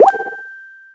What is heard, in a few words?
Collect Color Drip